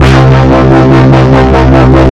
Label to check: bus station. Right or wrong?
Wrong